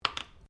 JAR GLASS LID PLASTIC PLACE BENCH; Various kitchen jars; Set Downs; Sound Effects:Objects; Foundation3

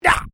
MALE VOCALIZATION ARGH

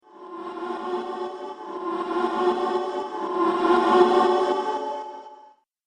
Throbbing Ghost Pulse; Ghost Sound Effects; Sinister, Scary, Spooky Or Just Silly; Haunted House Or Graveyard

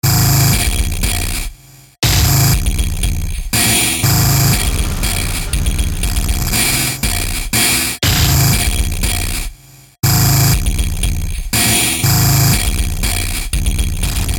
Beat Hardcore Industrial Glitch; Miscellaneous Loops

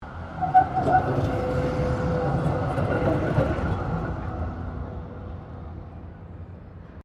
Tram Melbourne New Passing; Melbourne Trams; Streetcars; Vehicles; SoundEffects-Foundation4

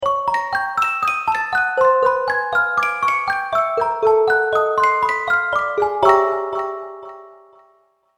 Music Box Creepy Music; Horror Music

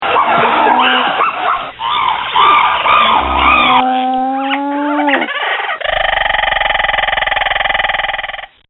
Chimps, monkey; Monkeys (Sound Effects:Animals); This sound effect was recorded in a custom built sound booth

